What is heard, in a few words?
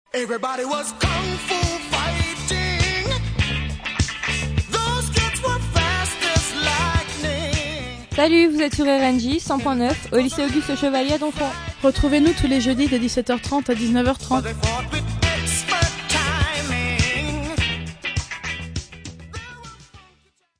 Jingle